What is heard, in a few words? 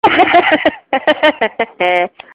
hehe hehe